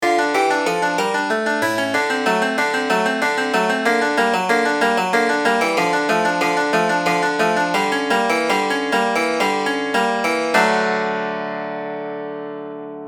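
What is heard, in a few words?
picardy, ending a minor key piece on a major triad is called using a Picardy Third; The effect is ending on a positive note, even if the key of the piece was sad!; altered chords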